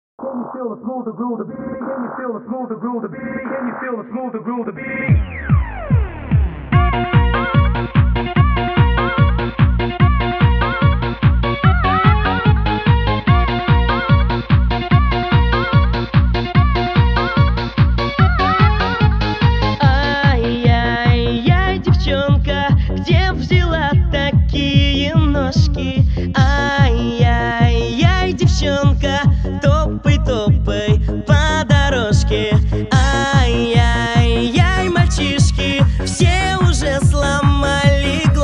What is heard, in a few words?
hardstyle mix